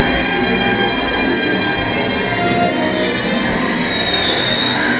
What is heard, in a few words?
vvvf